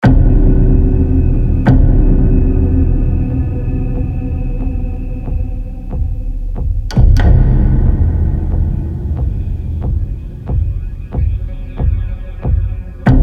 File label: Tension Hits Infected Chase; the last of us gamerip 2013